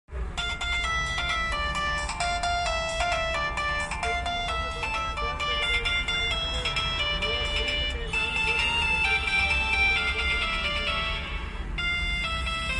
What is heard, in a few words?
old nokia ringtone